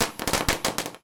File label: tone; Fourth; seasonal